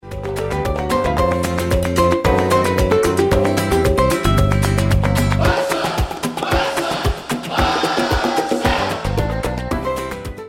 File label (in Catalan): Himne rumba; fcb